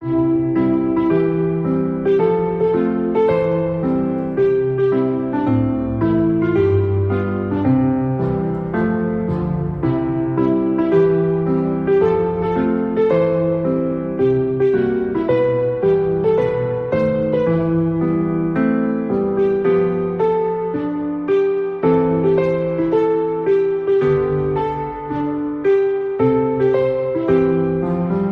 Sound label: Christmas Classical Music; O Holy Night